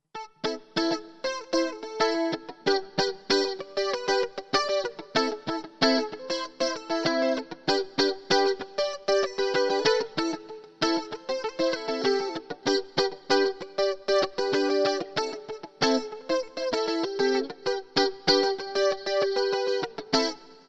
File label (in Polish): rytm samby dzwieki pentatoniki